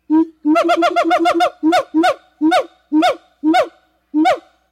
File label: gibbon; Nestle Animal Planet Land